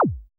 JUNO HIQ, TECHNO; Drums In Your FACE!

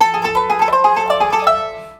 World Lick

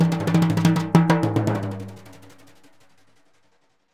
Synclavier Percussion, World & Orchestral; ILIO; Synclavier Percussion 2

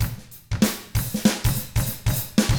95 FUNK, Drums; ILIO; Double Platinum Drums 1

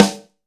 AMB SN, AMBIENCE SNARE

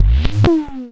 Cwejman S1 Sighing Soft Kick

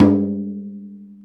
MalaiyuDrm

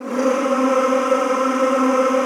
C3, Extended Classical Choir; Best Service

